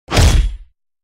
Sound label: hammer hit